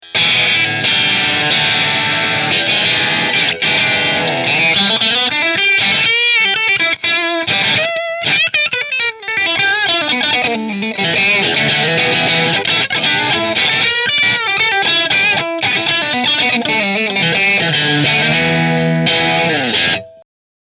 Fender Strat Kinman Position 1 Marshall, guitars

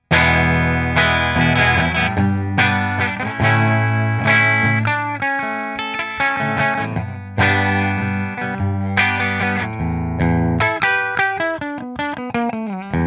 Fender Position 3 Fender

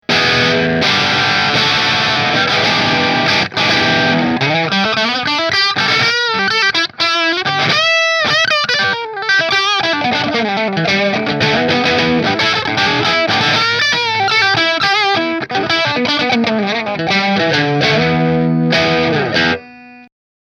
Melancon Bridge Mini Position 1 Marshall

